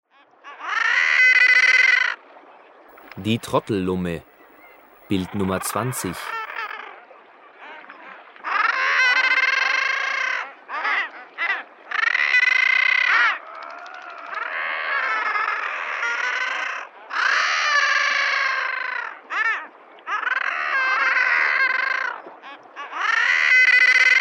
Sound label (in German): Trottellumme